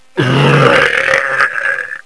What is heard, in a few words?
zombie idle; zombie plague